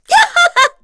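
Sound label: Vox Attack, korean; voices; heroes; FreyB; kingsraid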